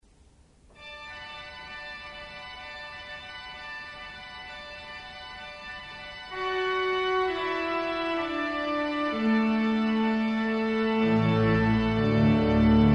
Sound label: Carillon de Westminster, Orgue; Orgue Couperin